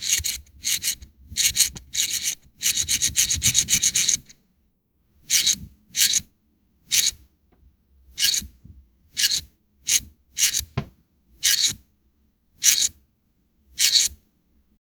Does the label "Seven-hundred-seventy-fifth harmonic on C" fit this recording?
No